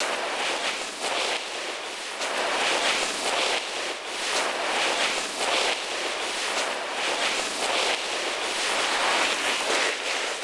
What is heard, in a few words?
SKID SAND; SIMUZENSOUND